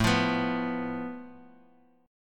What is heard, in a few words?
A Suspended 2nd Sharp 5th, Guitar, Open D